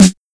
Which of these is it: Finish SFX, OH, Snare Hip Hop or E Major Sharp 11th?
Snare Hip Hop